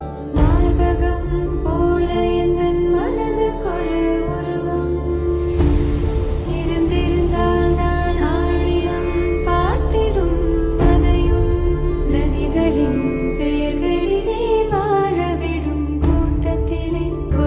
melody ringtone; tamil ringtones

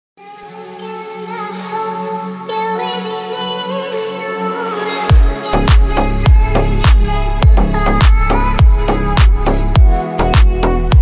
trending ringtone

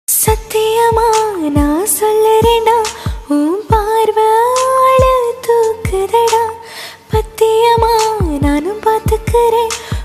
female version

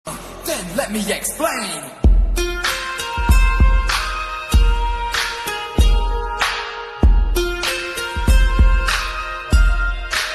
bgm ringtone; str

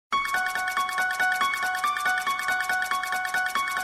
message halloween

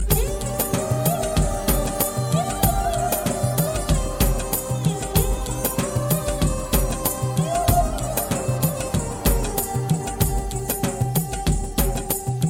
violin; taal

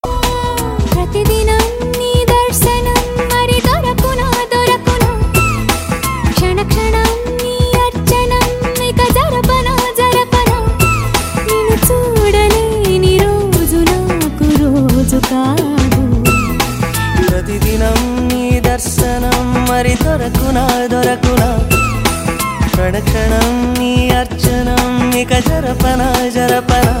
love theme telugu ringtone

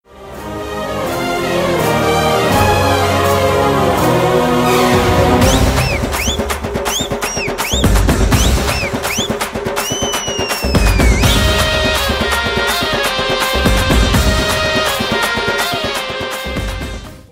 whistle bgm; tamil ringtones; seema raja

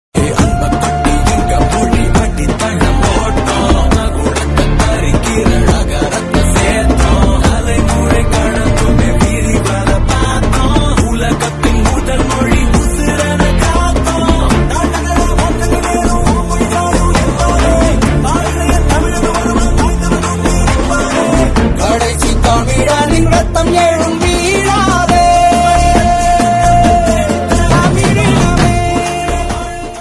music ringtone, beat music ringtone

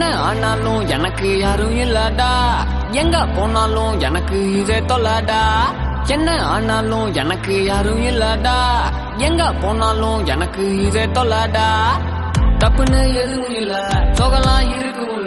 tamil album song

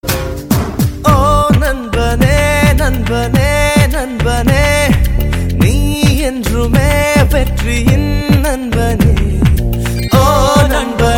ringtone

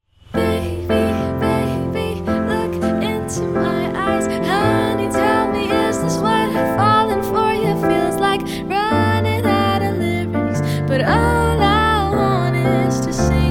flirting bgm

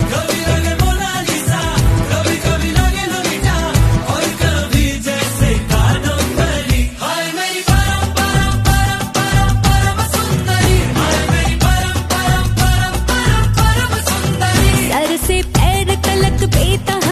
trending ringtone

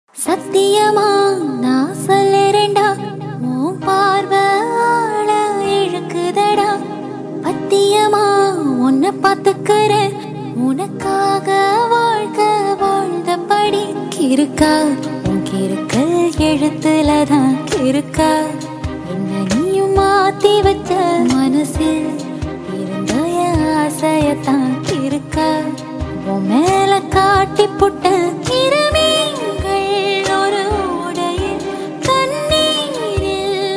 female version ringtone, tamil

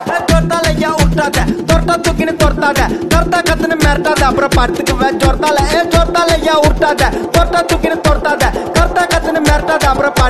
trending bgm

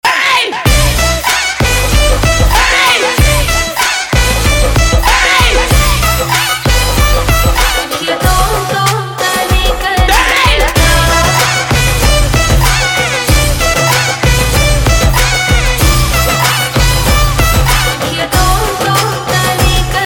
kerala song bgm; tamil; natpe thunai

